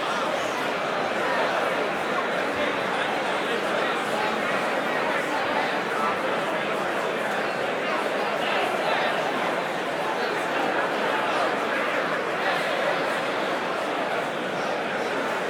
Crowd Loop; Lunaro

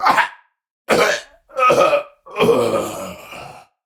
Death; Warframe; Tau; Dax; Ashigaru

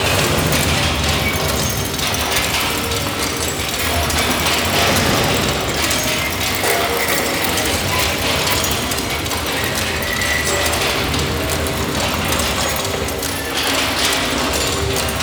Skin Printing Mechanism Loop; Ambience; Props; Warframe; Entrati

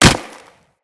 Kubrow Step Snow, Footsteps

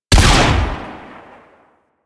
Blastor Pistol Launch; Warframe; Weapons; Corpus

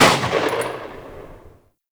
Lever Action Pistol Tail; Warframe; Grineer

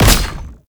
Projectile Impact; Ultimate Abilities; Madurai; Warframe; Focus

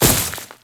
Grineer Step Veg, Footsteps; Enemies; Warframe

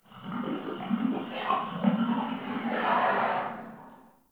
Digest Interior Small; Ambience; Warframe; Sentient Lair

